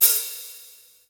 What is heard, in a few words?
Misc Cymbal; Mo' Phatt; Digital Sound Factory Mo' Phatt